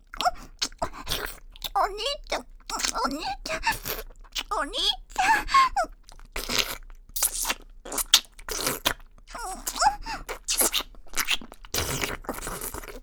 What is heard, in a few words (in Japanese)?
キス (ディープキス)